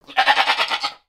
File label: Pets Goat Happy; creatures; Lego